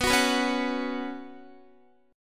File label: B Suspended 2nd, Guitar; Bsus2; strummed; x,14,16,16,13,13